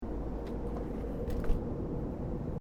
AIRCRAFT BOEING 767-300ER CRUISING HEIGHT INTERIOR TOILET DOOR CLOSE; Airplanes (Sound Effects:Vehicles)